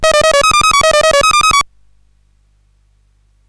sid, CSG8580R5; Blueprint

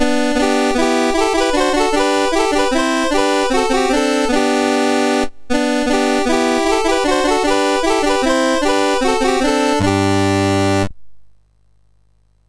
sid MOS6581R3; Games Summer Edition menus

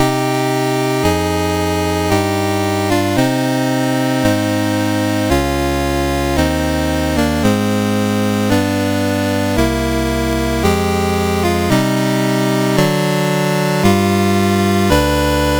Prelude in C Minor, CSG8580R5; Op28 No20

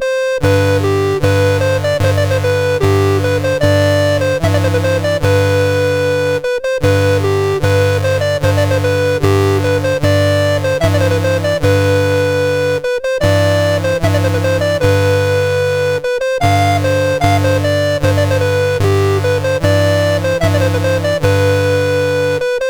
Bhutan, National Anthems, MOS6581R4

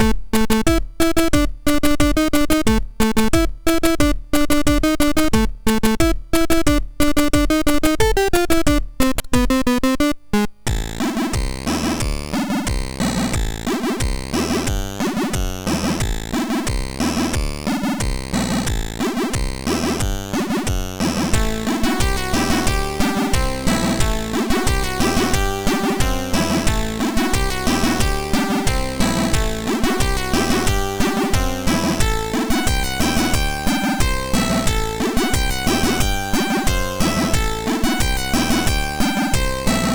sid MOS6581R3; Peronisman

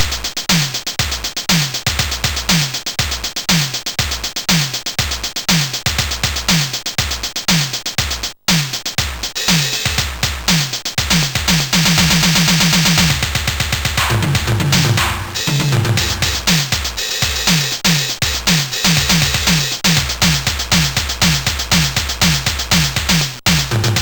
Digi Drums, MOS6581R2

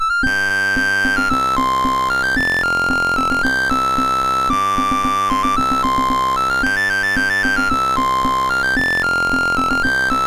Courante, MOS6581R3

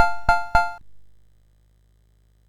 sid, MOS6581R4; Classic Concentration

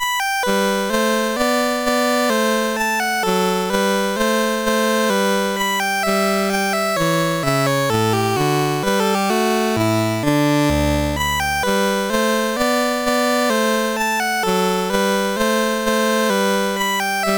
Minuet, CSG8580R5

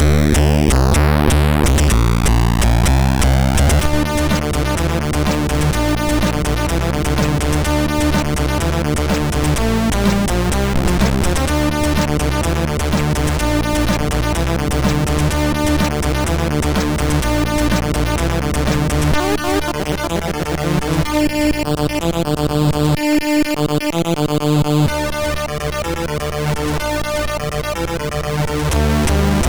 sid, MOS6581R2; Amazon

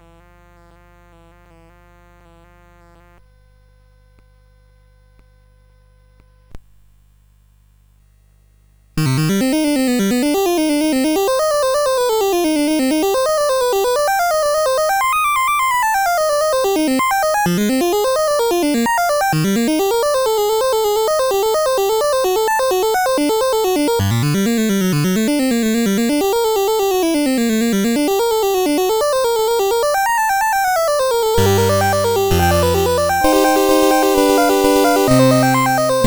Solfeggio in C Minor, CSG8580R5

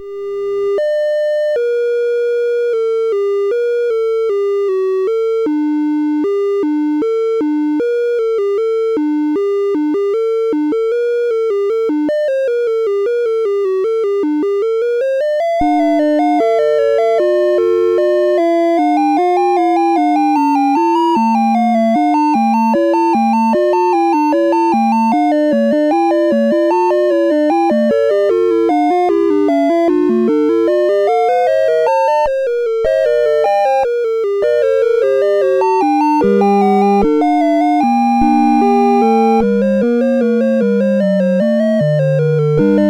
Fugue, CSG8580R5; BASIC

